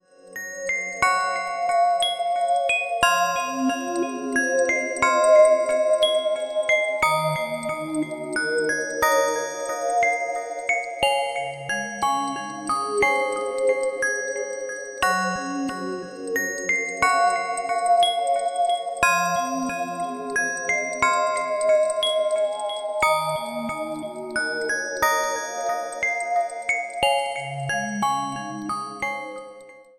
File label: Wind chime; MIUI12